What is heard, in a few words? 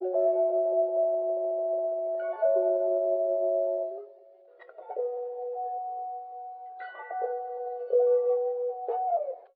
guitar high pitches, loops; Phonky